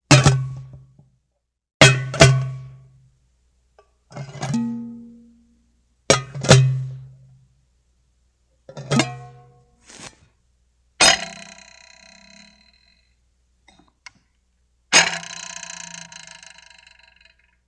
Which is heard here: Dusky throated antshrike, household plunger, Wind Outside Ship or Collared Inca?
household plunger